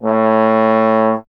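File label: bass trombone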